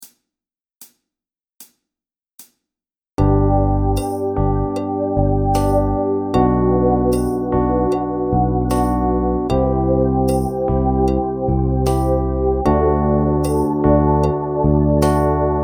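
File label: Drum Groove Slow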